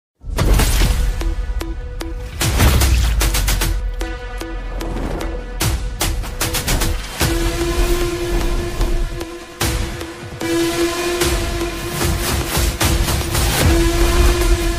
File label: bgm ringtone; power play; raj tarun